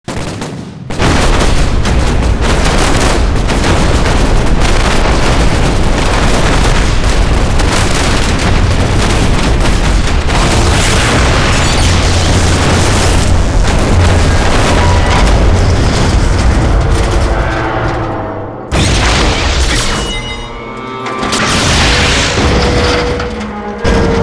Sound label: dogwhistle shot down; Titanfall 2